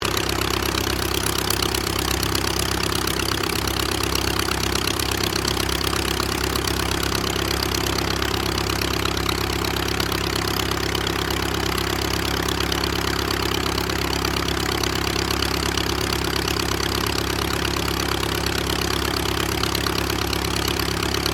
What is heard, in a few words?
AIRCRAFT AUSTER III IDLE STEREO; Airplanes